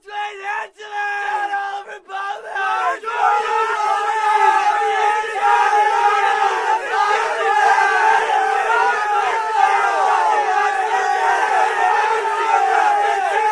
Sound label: screaming; Vietnam